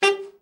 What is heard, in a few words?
ALT SRT, ALTSAX SHORT; Giga Samples Collection